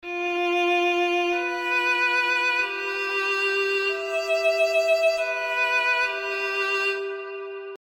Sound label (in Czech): housle